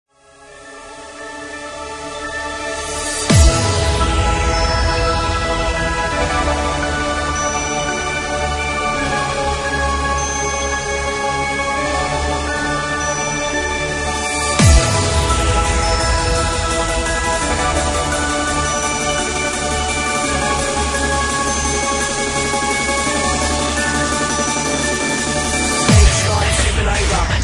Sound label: bassline supernova